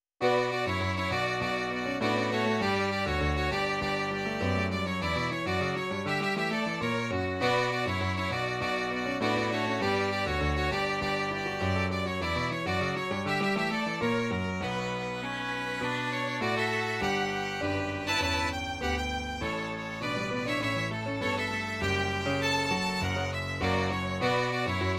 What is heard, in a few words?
Violin and Piano